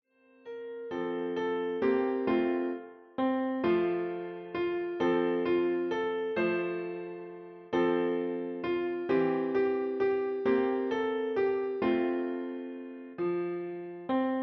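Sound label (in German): Ich geh mit meiner Laterne, Klavier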